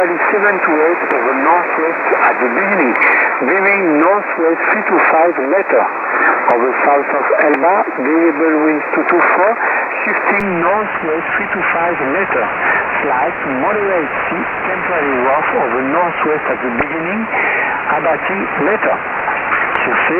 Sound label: 8728 khz - USB; Radio Monaco